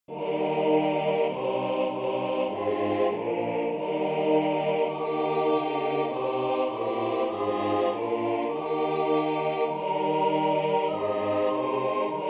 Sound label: Fairest Lord Jesus alto